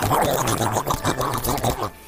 fil weird creature noises

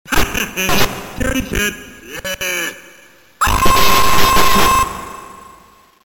scaredy cat bleh aaaaaaaaaaaaaaa

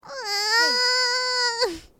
brat noises

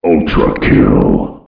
quake; ultrakill

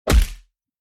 punch sound

